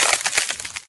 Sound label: Icegun Reload; MilkChoco